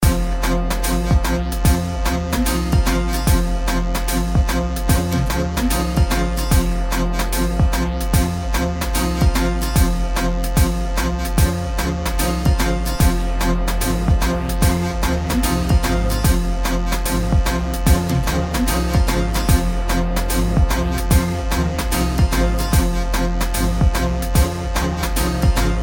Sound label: Swing Hop